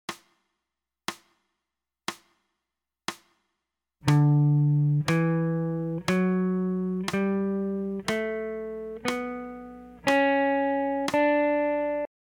d major up; scales

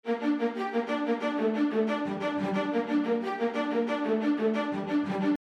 main string

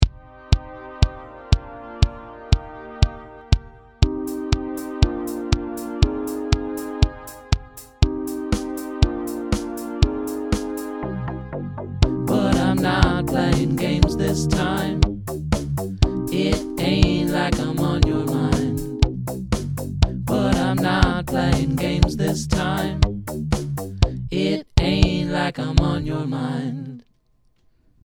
bass

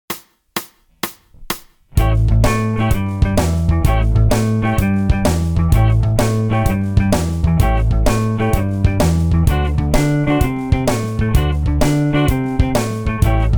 jam, 12 bar blues

